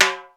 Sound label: TIMBAL, PERCUSSION; Crypton - DrumBase5000